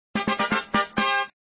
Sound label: Music Fanfare, flipper; Indy